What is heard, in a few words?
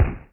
Apache Shot; shadez